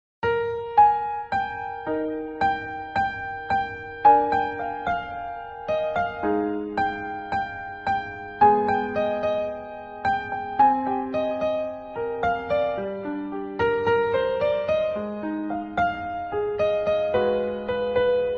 Piano Ringtone

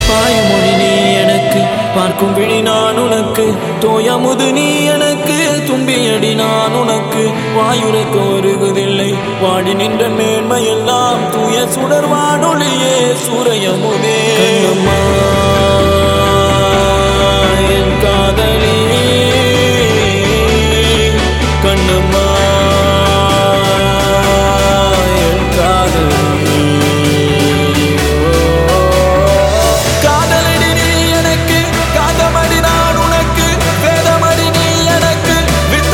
Tamil Movie Ringtone

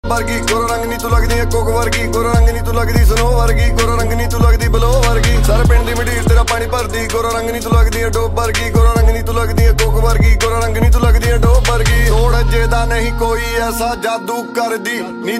Dance Punjabi Ringtone

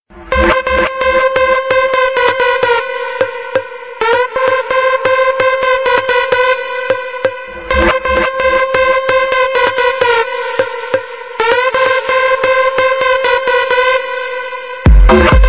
Disco Wakeup Ringtone

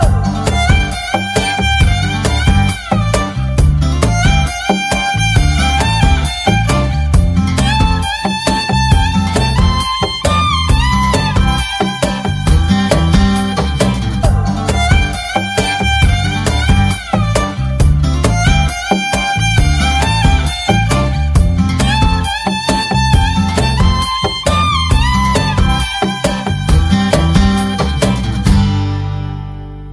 Instrumental Tone Telugu Ringtone

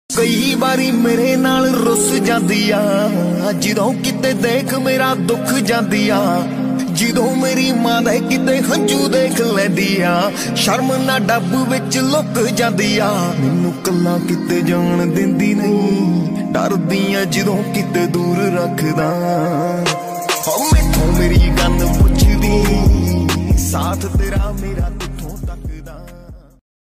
Punjabi Song Ringtone

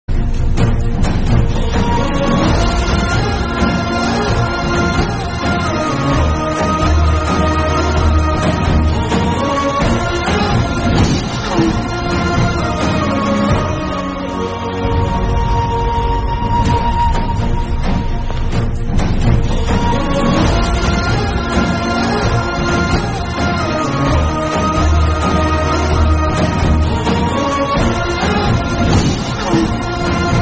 Sad Theme Telugu Ringtone; Oosaravelli

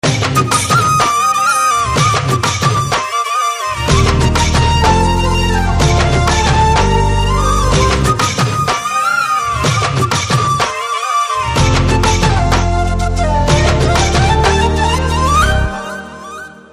BGM Flute Telugu Ringtone

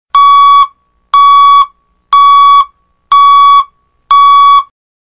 Backwards Truck Siren Ringtone

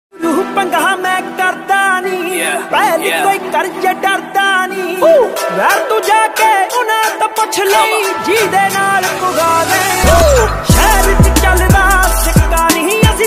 Desi Punjabi Ringtone

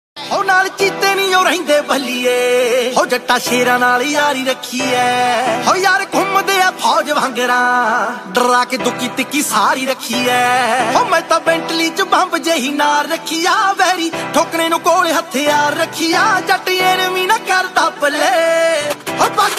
Punjabi Tune Ringtone

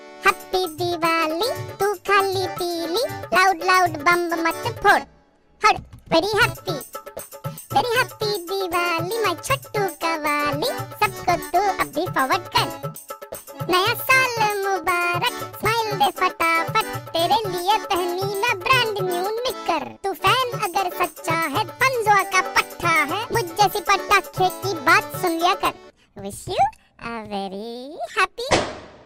Qawwali Ringtone

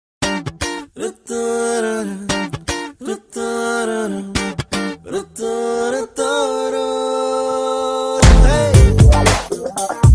BGM Telugu Ringtone

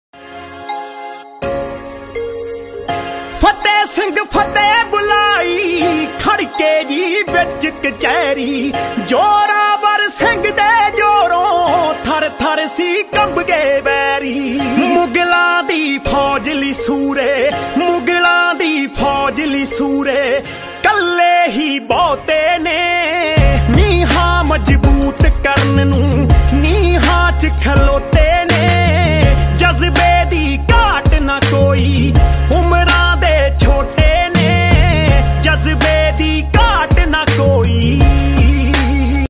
DJ Punjabi Ringtone

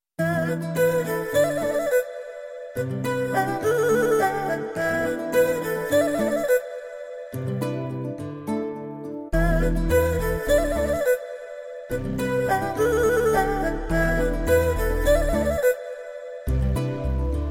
Guitar BGM Love Telugu Ringtone

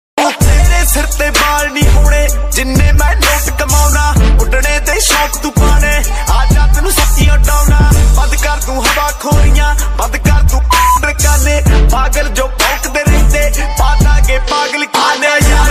Popular Punjabi Ringtone

